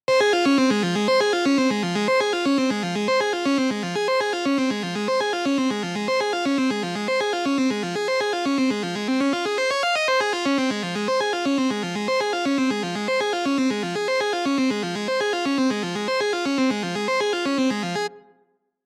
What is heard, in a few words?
Red Fog Analog Hard; Get Even